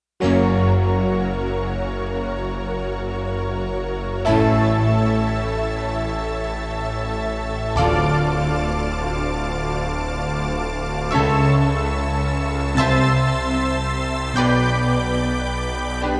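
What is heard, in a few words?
Slow Octave Piano Strings; Digital Synthesizer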